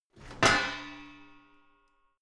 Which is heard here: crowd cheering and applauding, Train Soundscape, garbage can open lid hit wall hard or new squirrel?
garbage can open lid hit wall hard